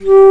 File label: BASS FLUTE G3; WIND